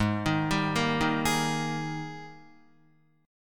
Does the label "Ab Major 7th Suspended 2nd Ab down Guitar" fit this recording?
Yes